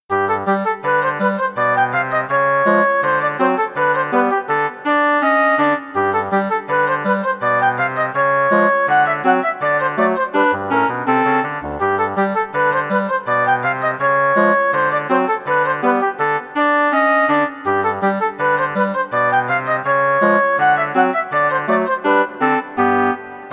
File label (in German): zirkusmusik